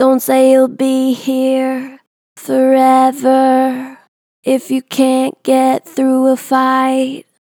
Gen Vib Dont say you be here, Vocals, Natural Vibrato Gentle; Harmor Vocals